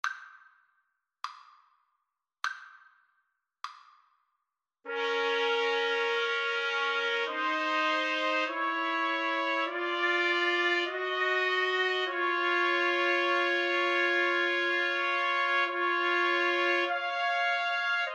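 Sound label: trumpet trio, karaoke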